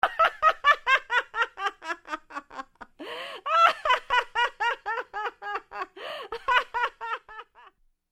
Woman laughing

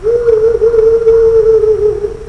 Barn owl hooting

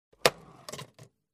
Receipt printer click

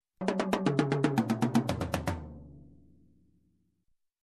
Long Tom fill

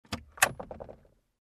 Car Interior Button Press

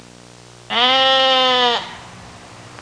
Single sheep bleat sound

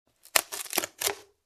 Small Branch Crackle

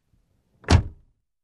Closing Car Door

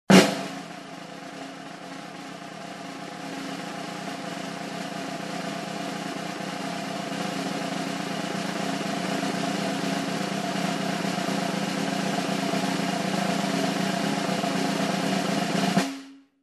Very long snare roll

